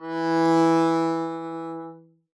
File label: arco e3 f up, double bass